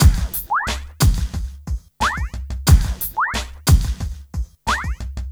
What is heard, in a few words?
Street Tip, R&B Drums